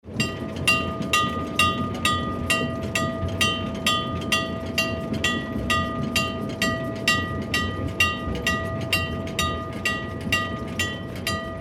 old train bell

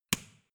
switch small turn off

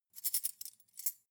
cutlery cake forks handle

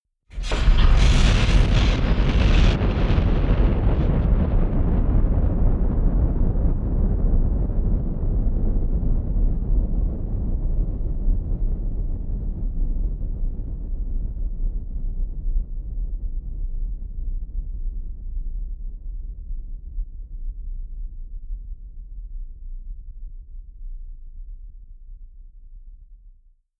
eerie burst large

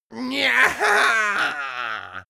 imp attack

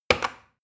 plastic button start click heavy fast

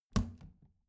plastic trash can close regular muted

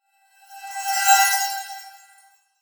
sweep

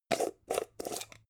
jar plastic midsize open long

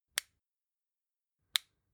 flashlight release

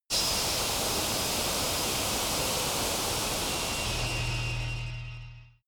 air pressure equalisation sealing

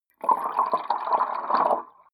flowing submerged small burbling

